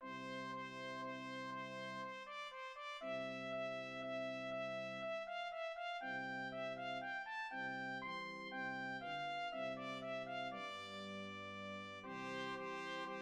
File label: Trumpet Duett